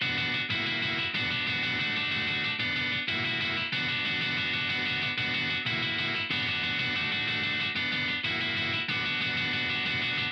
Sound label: EG Chord; Ample